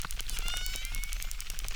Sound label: Chirp, acquarium